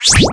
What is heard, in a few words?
Pullout Robot